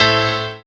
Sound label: SYN PIANO, dance synth; Sound & Vision - Gigapack I CD 2 (Roland)